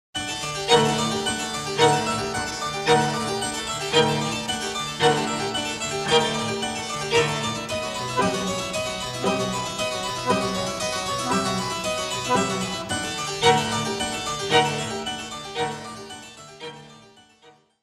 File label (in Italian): clavicembalo